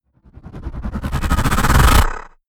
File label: whoosh stutter; swooshes-swishes